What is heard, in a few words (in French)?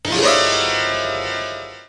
echec; TCHATCHE